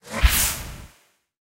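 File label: debrief achievement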